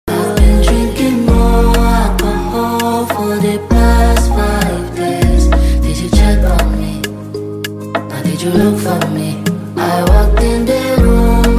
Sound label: Instrumental Hook Open Verse beat; Sojistar